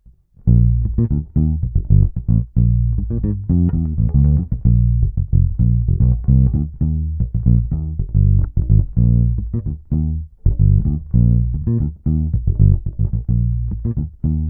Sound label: Motown Fingerstyle with little Overdrive